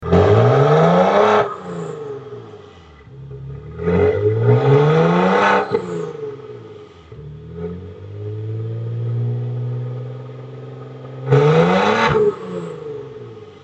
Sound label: Maserati V6; maserati ghibli